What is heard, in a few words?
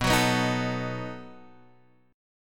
B 7th, B7 chord, Guitar Standard; B7 strummed; x,2,4,4,4,5; JGuitar